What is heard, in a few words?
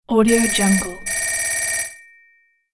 telephone ring